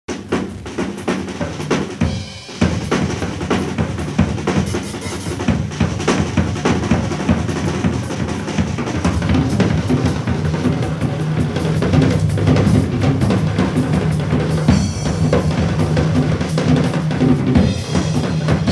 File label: solo